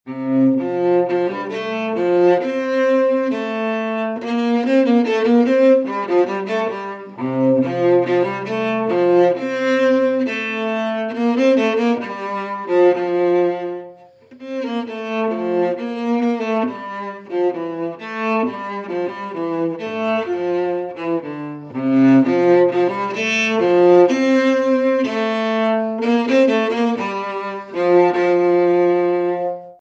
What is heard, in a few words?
wood cello traditional sequence; loops